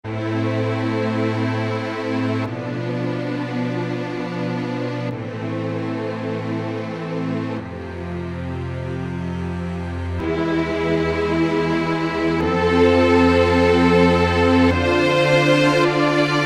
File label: Staccato String Orch; IK Multimedia; SampleTank Free